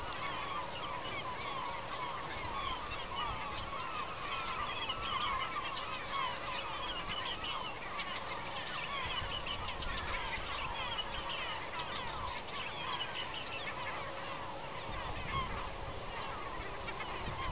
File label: hippolais; kultarinta